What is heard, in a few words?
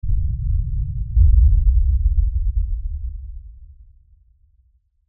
LFE Explosion, Lfe Explosion Low Bass Blast Rumble; Blastwave